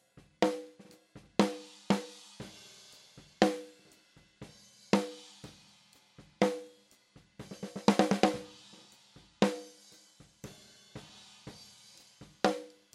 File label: Snare up